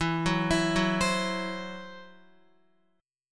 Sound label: E Suspended 2nd Sharp 5th, Guitar, Esus2#5 chord; arpeggiated; x,7,6,9,6,8